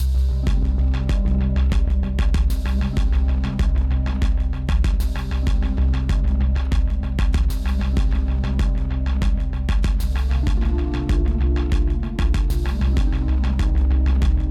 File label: dark city